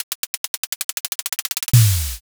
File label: drumroll